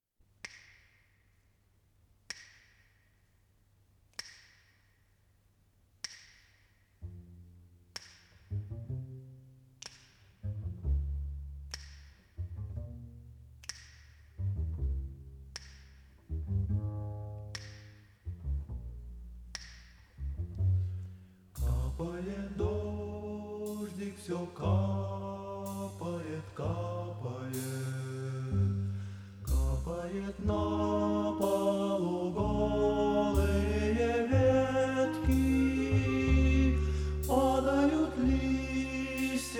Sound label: Instrumental Ensemble